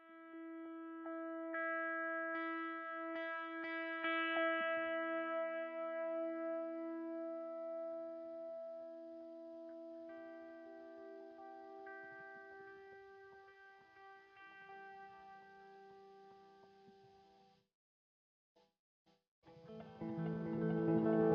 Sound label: G steel